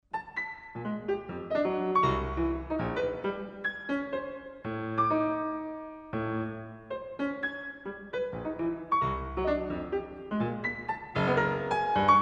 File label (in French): diaphonie